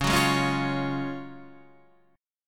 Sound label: F sharp Major 7th Suspended 2nd